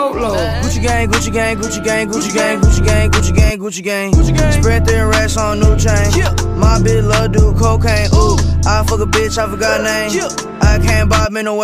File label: new hip hop ringtone